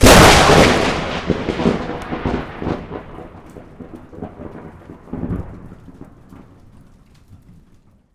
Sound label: close lightning; ott3d